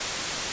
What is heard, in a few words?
camera interruption; Five Nights at Treasure Island 2020 Remake